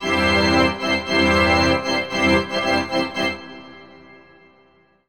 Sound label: Win Small; Carnival Games; wii gamerip 2007